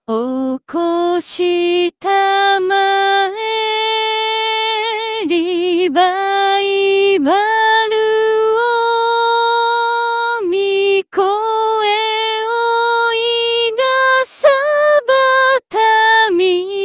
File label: soprano; easter